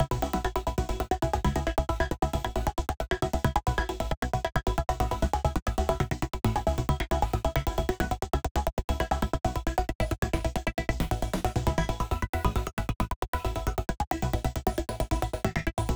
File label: synth loop E 135 bpm; railways; base pack